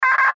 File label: Radio Morse Code; Weak Signal Letter M; Warfare; Blastwave